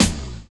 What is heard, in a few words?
snare BASS, Roland; Roland L-CD701; Snares 4; Sn Modules 4